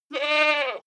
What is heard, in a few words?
goat baa male